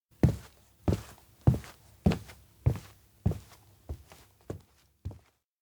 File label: steps indoor school home return; makoto; anemoi パッケージ版 1.1